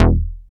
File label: BS STAB; AMG - Now CD-ROM (Roland); Pete Gleadall; Samplography